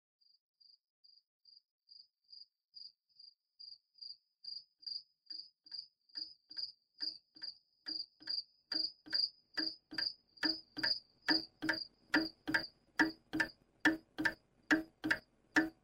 crickets clock morph

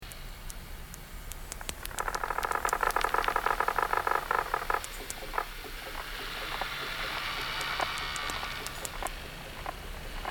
bubblewrap pops

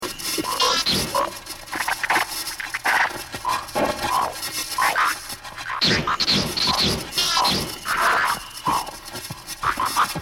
Spitting

